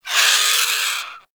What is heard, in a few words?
Avichaea Vocal Death; Hunting Critters